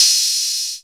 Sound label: Hats Electro Open